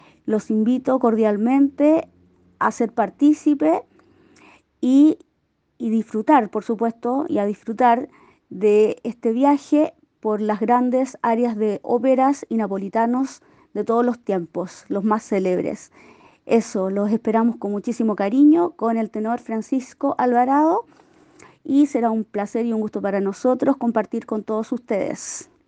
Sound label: soprano; concierto lirico en el centro cultural